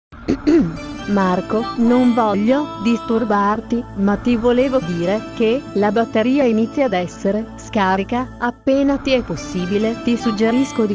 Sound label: low battery